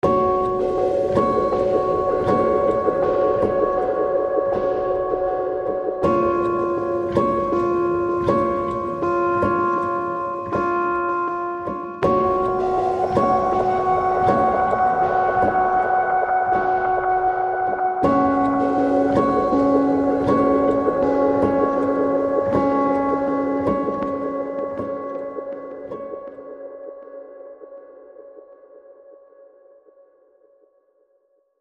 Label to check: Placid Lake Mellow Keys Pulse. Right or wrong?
Right